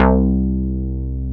DANCE BASS; AKAI S6000 CD-ROM - Ultimate

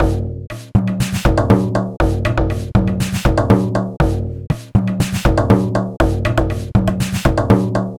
Frame Drum Loop, Ethnic Instruments

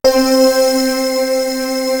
slider, synth; East West; Global Sounds

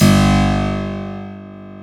KEY HRPCR, Roland; Roland - LA Composer vol. 1

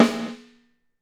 GATED, snares; Sampleheads - New York City Drumworks VOL-1

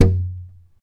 TAP, FLAMENCO Guitars

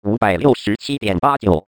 vocal fx 138, Techno; Techno ID; atmo+fx-loops

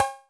Snares, Elektron MachineDrum SPS1 MKII

